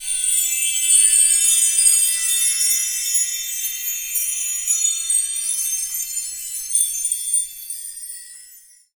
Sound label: BELL TREE; 3D Sound; 3D Keys VOL-1